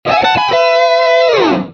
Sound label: correct riff